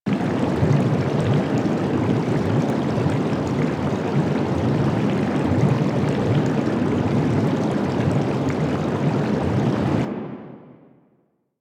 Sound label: Alchemy Lab Bubble Loop; Warframe; Hub; Liset Alchemy Lab